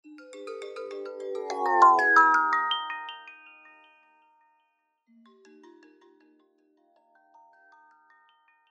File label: doppler shifted ringtones ios